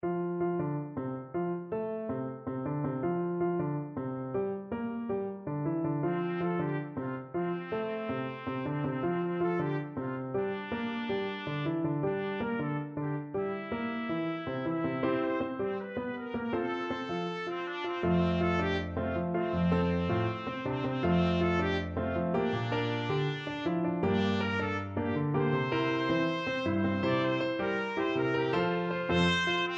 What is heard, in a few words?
salsa trumpet; jingle bells salsa